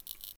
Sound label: spark